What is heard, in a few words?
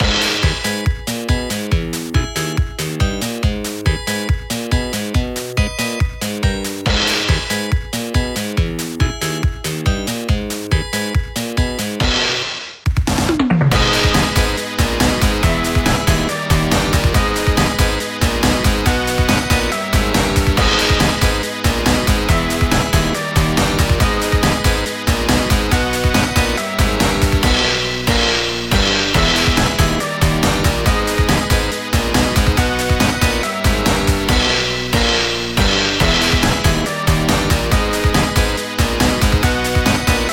Instrumental; Bird; Friday Night Funkin' Undying Phoenix